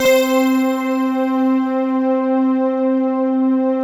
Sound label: STAC, synbell